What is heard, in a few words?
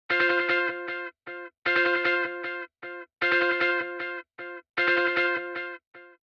delay on